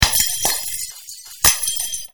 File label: glass; Csound; harmonia